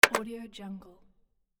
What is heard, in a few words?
button press